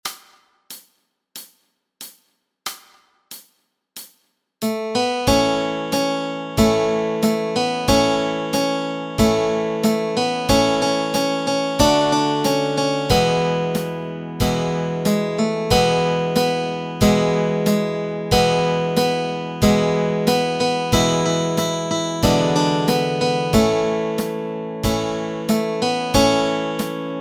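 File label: melody and chords, Easy Guitar and Ukulele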